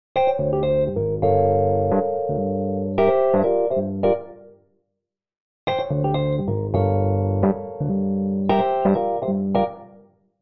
pickup symmetry

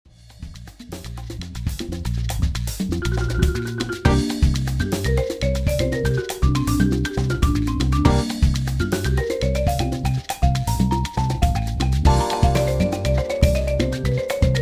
marimba jam; pianoteq8; xylo

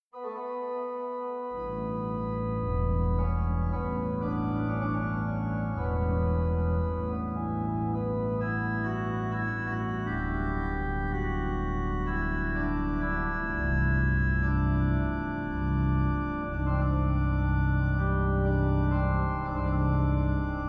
Cornet, organ; demo; organteq2

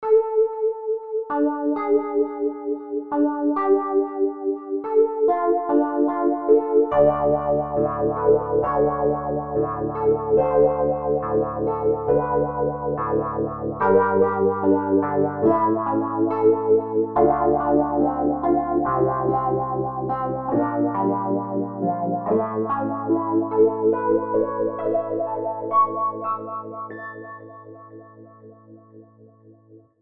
Pianet N Orion Echo; hohner; pianoteq8; Hohner collection

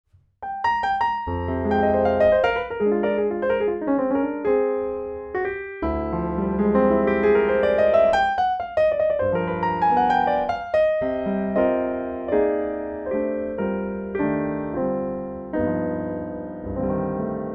hammer noise

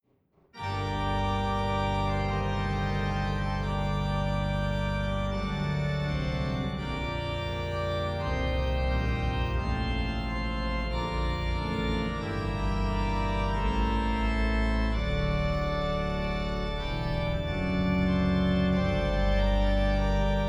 Plenum, organ; organteq2

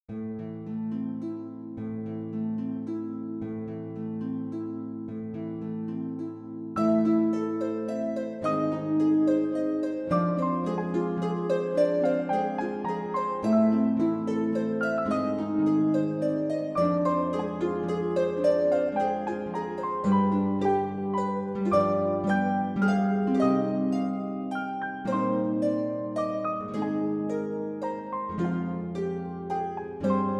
Harp and Piano; pianoteq8

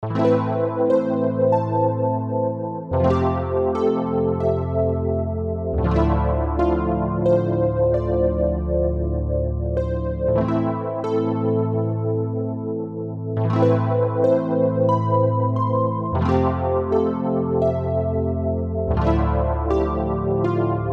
Pianet N Psychedelics; hohner; pianoteq8; Hohner collection

